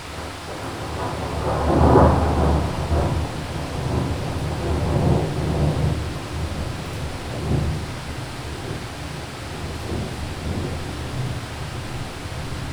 mountain thunder roll with heavy rain, A storm recorded in Southern Carpathian Mountains; soundscapes